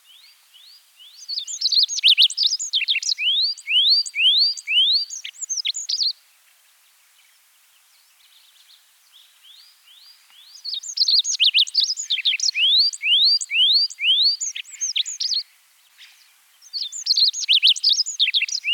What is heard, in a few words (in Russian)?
pyatnistyy konek golos